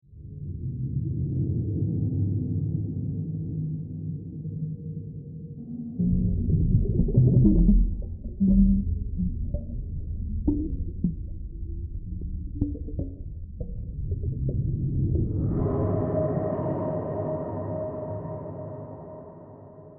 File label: Cinematic underwater texture